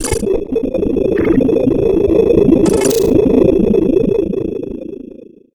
glitch transmission

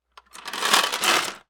clicks clanks

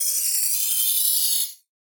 glitch high

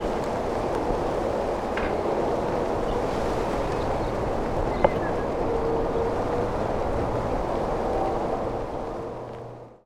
wind ambience

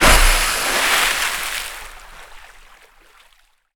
splash large

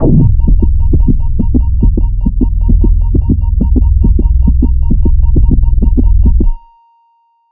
medical ekg flat line

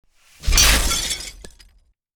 crash glass

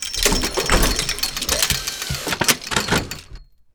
gears cranks machine

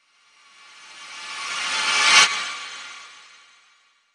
scary high zing

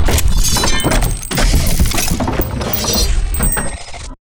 robotic

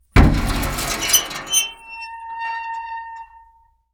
debris metal

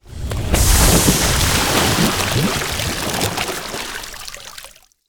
water swish

